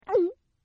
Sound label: Gulp, Eating; Human